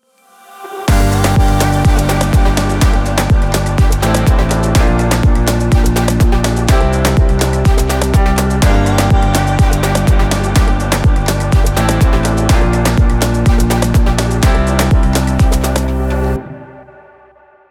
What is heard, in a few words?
travel music short